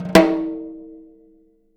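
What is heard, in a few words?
jazz snares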